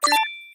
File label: get bonus; Alpha-E-Corp